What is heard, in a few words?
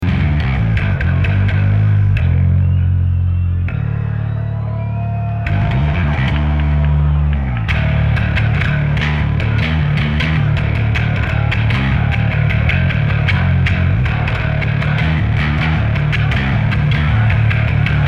Bass Solo